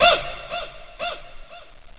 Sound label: woo crunk shout; ooh ahh; ho hoo woo